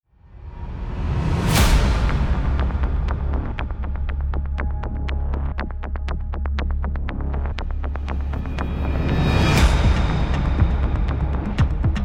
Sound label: music